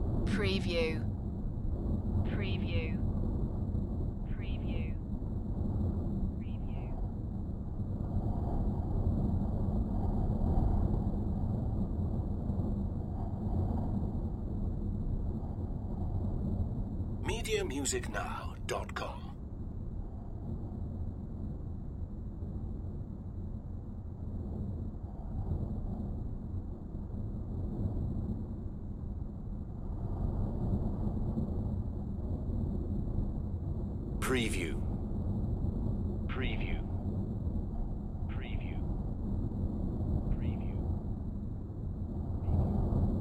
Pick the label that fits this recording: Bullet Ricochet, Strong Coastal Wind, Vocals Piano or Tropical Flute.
Strong Coastal Wind